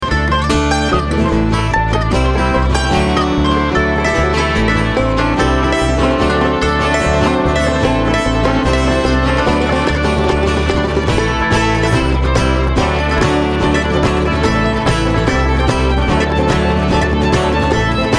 Traffic Jam

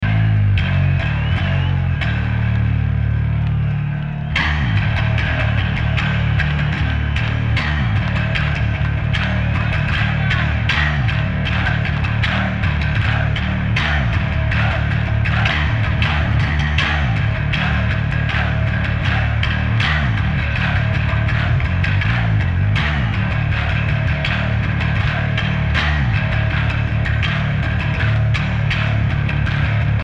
Bass Solo

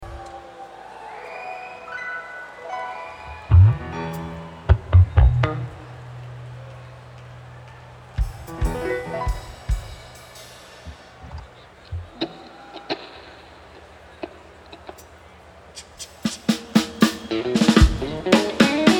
tuning

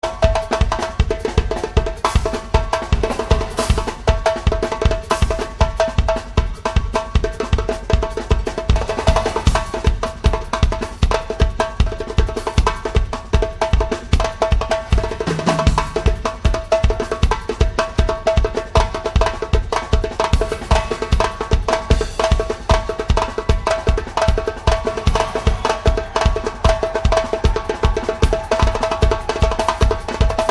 Percussion

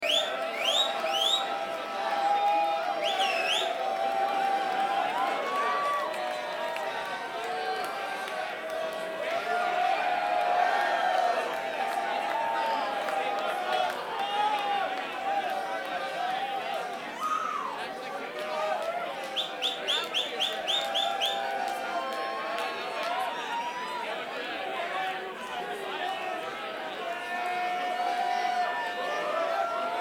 Applause

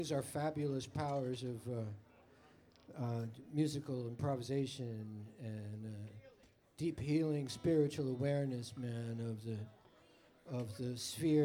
tuning

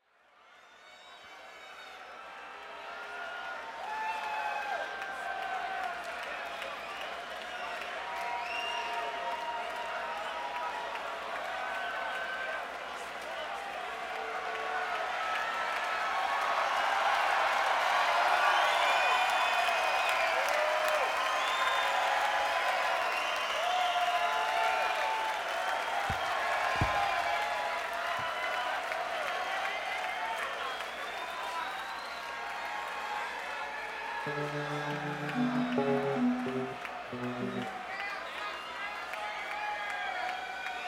Crowd

